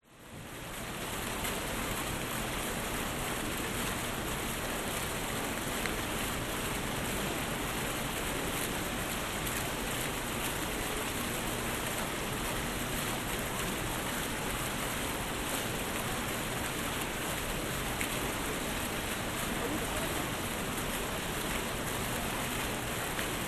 public places city street pedestrians by fountain night brisbane binaural